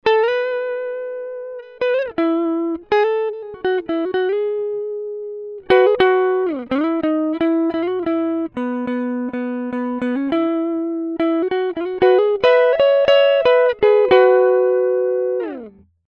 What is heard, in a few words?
guitar; UFX plus